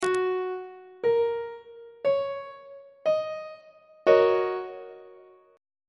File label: e flat minor 7th 1st inversion on treble clef